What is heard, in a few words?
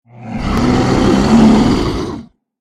Ferocious bear roar